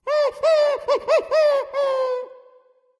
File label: wolf; fenrir